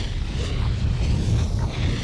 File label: THUNDERBALL LOOP